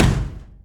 metal door full open; doors; Counter-Strike Global Offensive

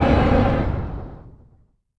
Dread Run End; Sid Meier's Civilization 4 Complete; Beyond the Sword; Units

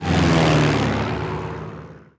air mapmode; Hearts of Iron IV Death or Dishonor

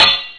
clang metal; Mount&Blade Warband

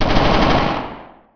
light infantry; Emperor - Battle for Dune

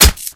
slicer, machines; Garry's Mod; hl2

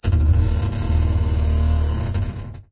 docked boat; ambient; random amb sfx

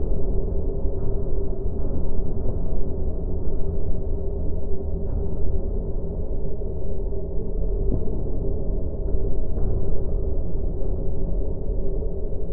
AMB Hive; Duke Nukem Forever

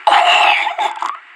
death; player vo anarchist; Counter-Strike Global Offensive

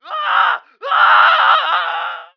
burn dying; Emperor - Battle for Dune

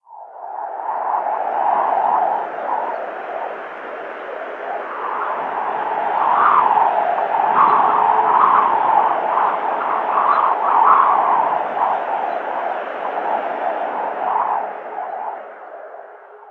thin wind gust, ambient; Left 4 Dead 2; random amb sounds

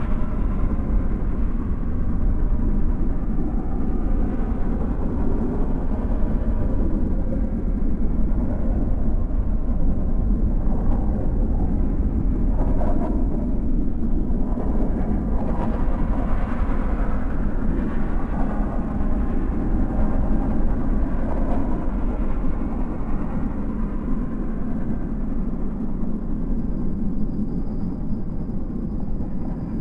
Nebula Amb Bed Lp, Ambient; Sid Meier's Civilization 4 Complete; Beyond the Sword

